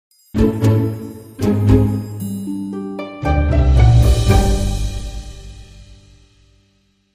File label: Dramedy Sting, Short